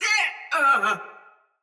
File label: Death Soldier Mace Agent Female